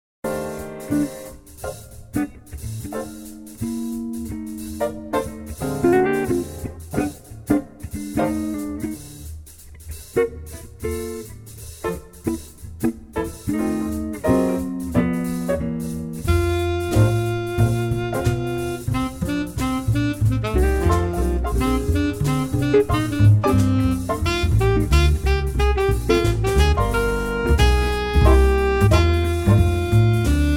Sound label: Cool Cat Jazz